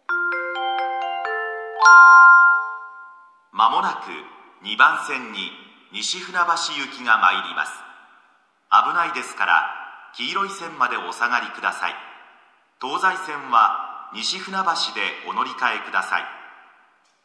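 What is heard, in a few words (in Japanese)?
駅放送, 2番線 接近; funabashi-nichidaimae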